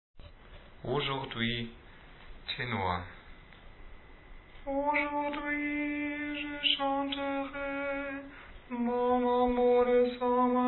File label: Tenor